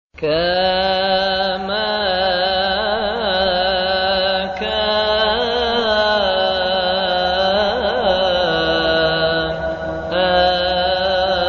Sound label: kama kan arabic